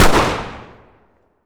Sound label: glock20 fire, pistol; garrysmod